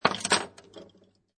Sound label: Pinball Sound Ball Land Roll And Touch Metal Objects; Video Games